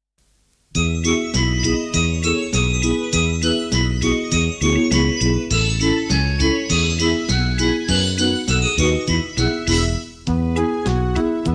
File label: Jingle Bells